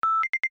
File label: Workout Pace Above; iOS Sounds